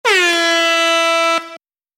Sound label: airhorn